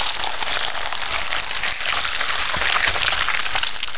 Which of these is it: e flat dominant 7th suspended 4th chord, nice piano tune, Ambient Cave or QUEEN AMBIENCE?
QUEEN AMBIENCE